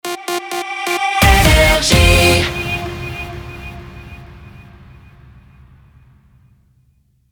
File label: Jingle Rapid Fire; NRJ